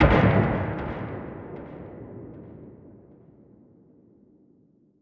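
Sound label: magic fireball explosion distant; skyrim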